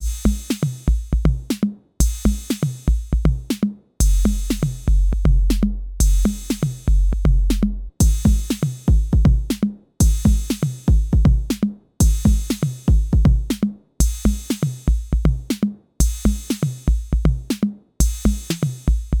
Roland TR-06 Boutique Simple Beat Alternative Sounds